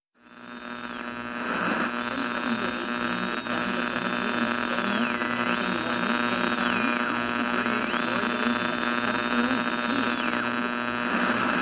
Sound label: Radio Static Sound Effect